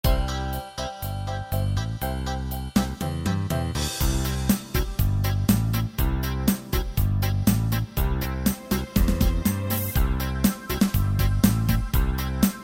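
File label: POP C major, Latin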